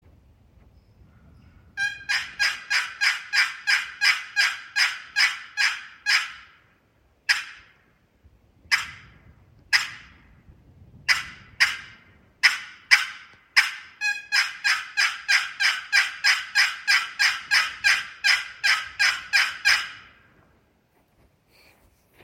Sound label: Bloodwood and Cherry, turkey calls; Bloodwood over Cherry